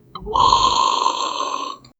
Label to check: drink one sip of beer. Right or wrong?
Right